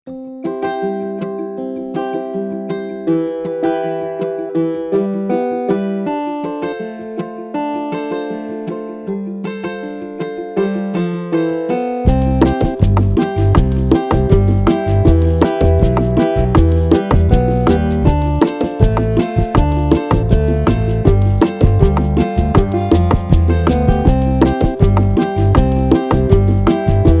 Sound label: Classic Guitar latin